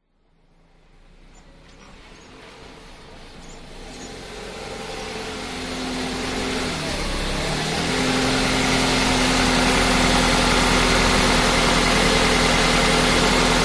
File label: vehicles car approach into garage idle off; zapsplat